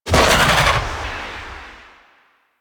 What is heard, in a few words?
Fairy Soul Cast; DE; Warframe